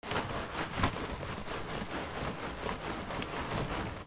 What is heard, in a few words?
FRICTION